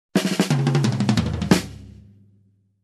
Fast rock drum fill

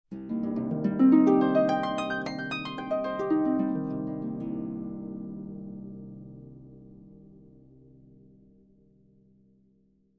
Mysterious minor harp glissando

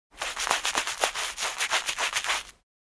Steel Brush Cleaning

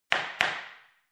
Judge gavel hit two times

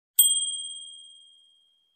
Microwave bing

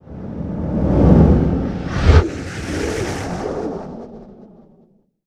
Heavy whoosh sound

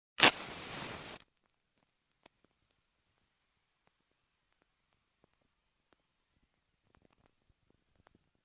Match lighting up on matchbox

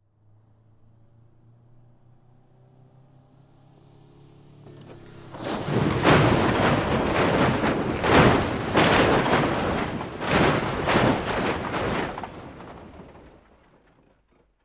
Car Crash on Scrap of Metal

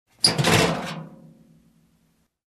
Metal Trash Can

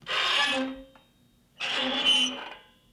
Aluminum Door Screen Creak fast

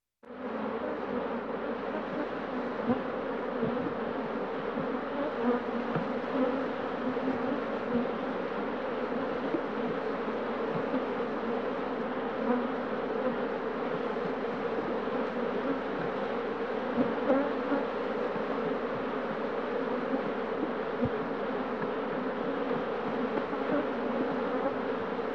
Massive Amount of Bees

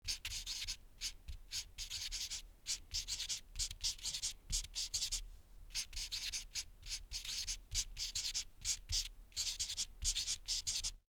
Taking notes with a marker

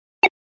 Short Computer Bleep

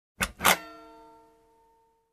Steel Door clink

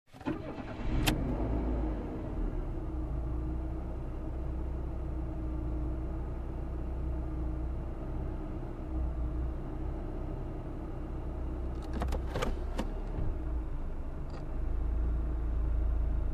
Calming ride in car

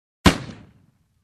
Pistol shot sound

